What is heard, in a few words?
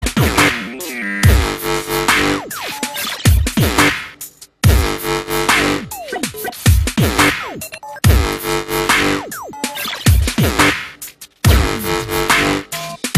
Popping Beats, percussive pops